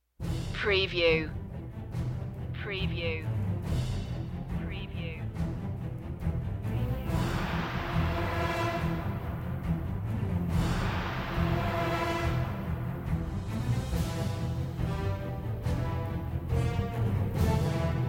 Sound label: no choir no solo vocal